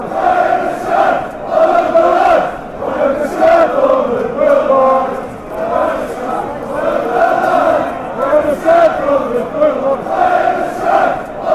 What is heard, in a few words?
beerschot chant, fanchants